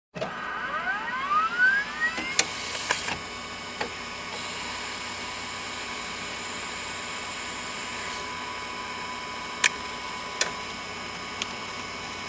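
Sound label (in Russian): принтер лазерный работа печать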